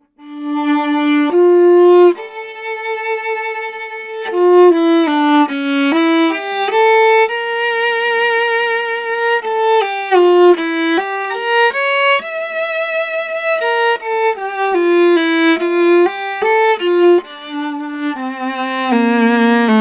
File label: viola; super cardio